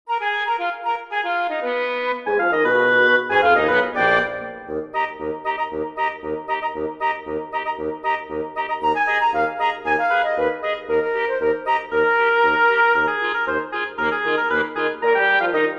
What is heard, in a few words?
woodwind quartet